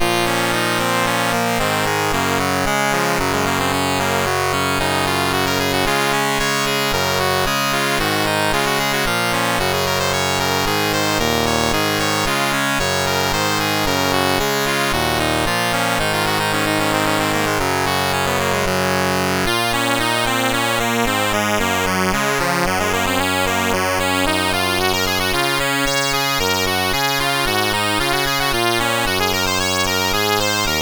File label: Welsh Morris Dance, CSG8580R5